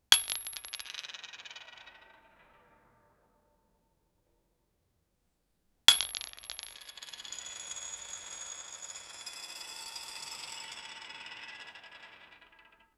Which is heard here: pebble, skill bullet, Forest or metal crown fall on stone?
metal crown fall on stone